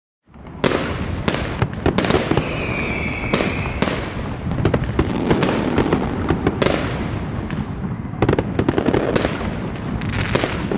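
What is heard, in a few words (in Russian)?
новогодний огромный; звук салюта или звук фейерверка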